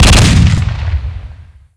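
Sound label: double barrel shoot, weapons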